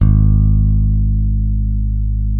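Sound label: Sadowsky 5 bass, G#1; Sampleheads; Will Lee Bass Library; SADWSKY5 SUS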